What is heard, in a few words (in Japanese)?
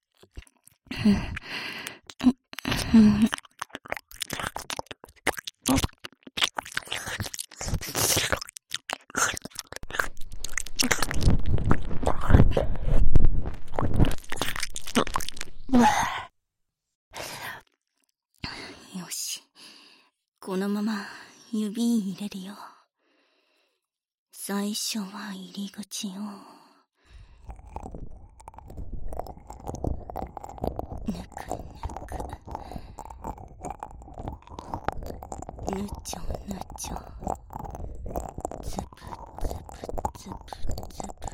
【右】唾液指耳かき; 陸上部の寡黙な先輩にお耳をひたすら弄られる